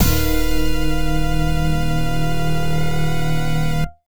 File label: Fatality Executed